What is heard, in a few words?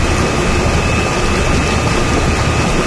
turbine loop, ambient machines; Source Engine; cstrike